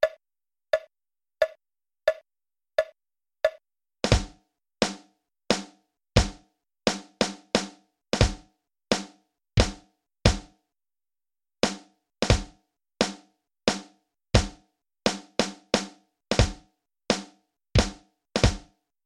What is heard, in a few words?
Drum Recordings; drums